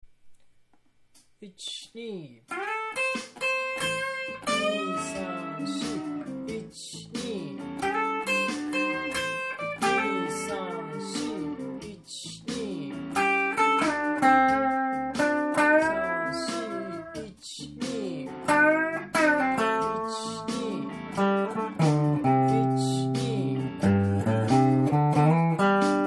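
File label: A blues original solo, guitar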